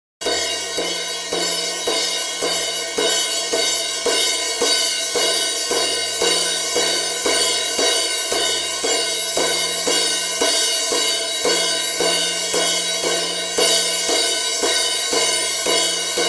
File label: ada8000 vs saffire pro crash; interfaces